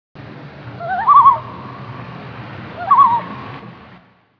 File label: loon